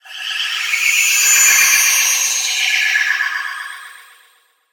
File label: Hypnowave